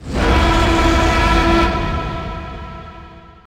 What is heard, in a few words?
tyrannosaurus death; paleotest